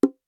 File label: button pressed, sitar; stereo